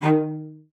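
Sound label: CELLO spiccato D#5; Xsample VOL-5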